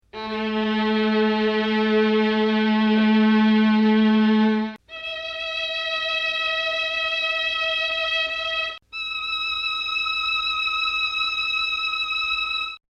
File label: 3 violins, mellotron